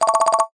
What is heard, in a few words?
game sound mallets tally fast short